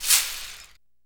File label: Abacus Rattle; Electa Quinney